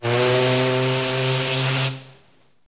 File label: HORN, Seaport; PC Gamer IT CD 40; zones